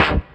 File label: Stab C2, Club Techno; Zero-G; Stabs